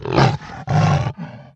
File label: attack; mahlendur